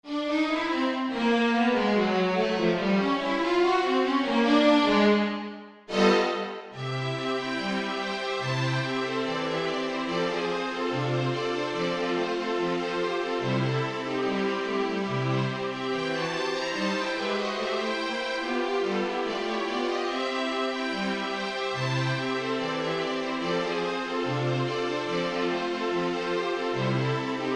rambling rag no swing vs swing; without swing vs. with swing

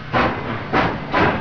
engine

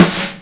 snare

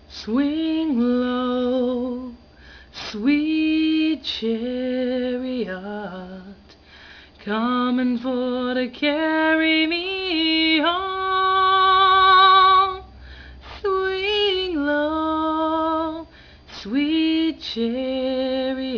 swing low

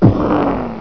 explosion

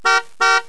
HORN

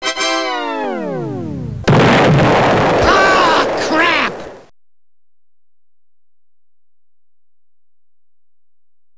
Windows Startup

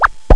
zing pop

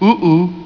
ooo ooo

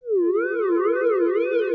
weird

